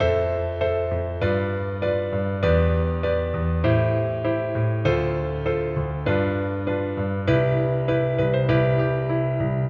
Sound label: piano 7th